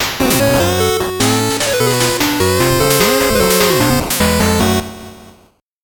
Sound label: 8bit jingle slow